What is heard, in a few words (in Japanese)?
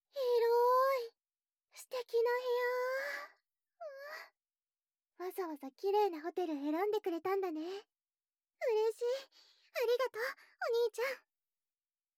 キス・フェラ・耳舐め・パイズリ, ASMR